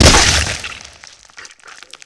Breaking bones and crushing flesh

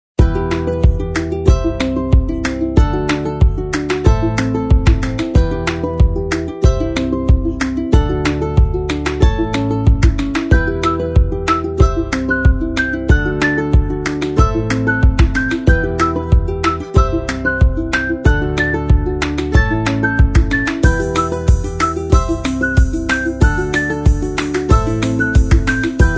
Summer Rain

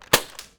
pistol dart

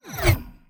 Woosh dry; افکت صوتی

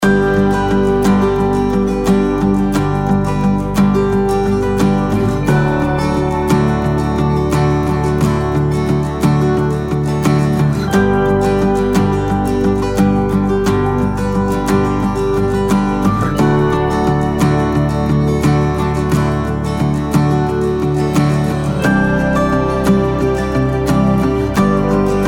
Beautiful Time looped version, موزیک پس زمینه

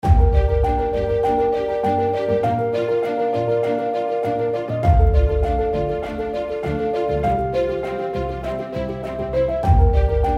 Cinematic, موزیک پس زمینه

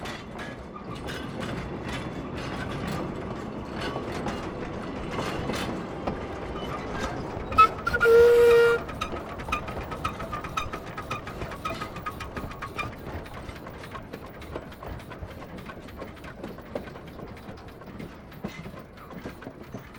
train movement

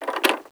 Open and close the lock

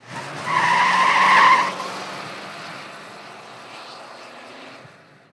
Spike brake

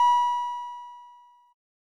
sound airplane speaker

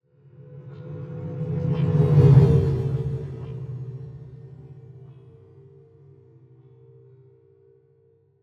Spaceship; افکت صوتی

